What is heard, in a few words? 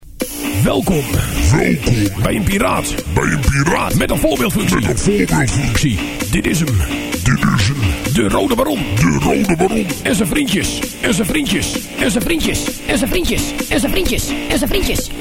Jingle; Audio Fragmenten